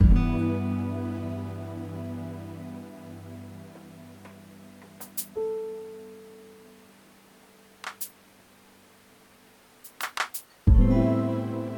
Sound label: Loop; Mixolumia